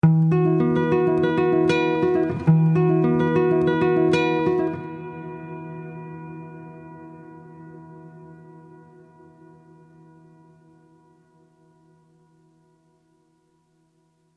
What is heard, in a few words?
guitar long dark